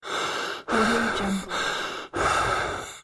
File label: human inhale exhale male deep paced loop